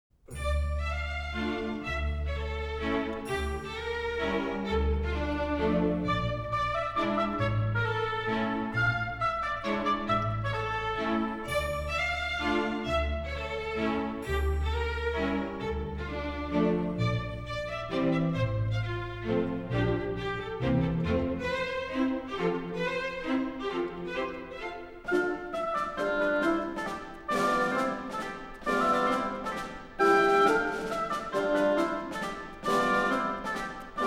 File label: mazurka